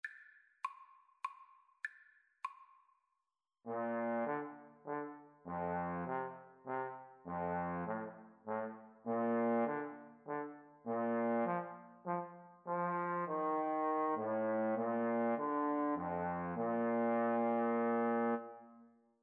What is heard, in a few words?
happy birthday, Trombone Duet